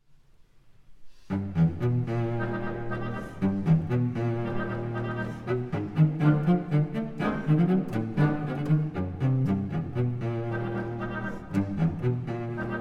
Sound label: Cello Concerto in E Flat Major, Allegretto, Live